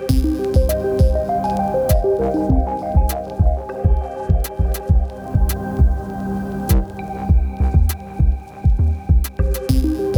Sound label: Spacey Arp; BB 2HTC Samples Vol 4; Weird